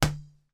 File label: toy plastic inflatable ball hit window